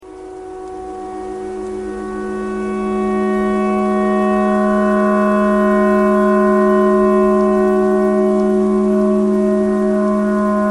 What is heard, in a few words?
clarinet tornado siren thread simple